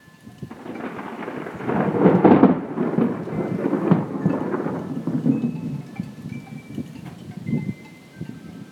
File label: Lightning Discharge